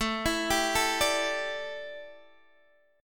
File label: A 7th Suspended 4th Guitar Open D, chord; x,12,14,13,12,12